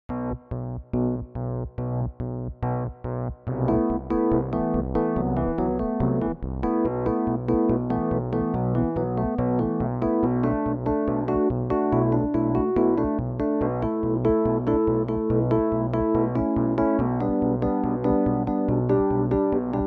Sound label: Electric Piano